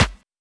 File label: Claps